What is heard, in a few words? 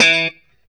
POP E 3, STRAT guitar; Spectrasonics; Hans Zimmer Guitars VOL-1; JC-STRAT POP